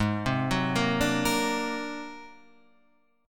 G sharp 9th Flat 5th G sharp; G#9b5 arpeggiated; 4,3,4,3,3,6; JGuitar

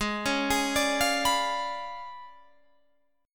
C sharp 7th Flat 9th, chord

